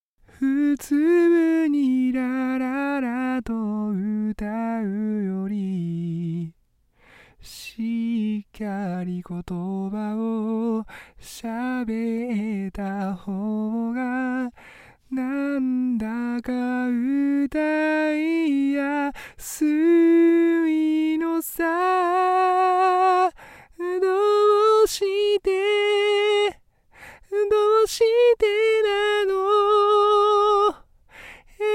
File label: vocal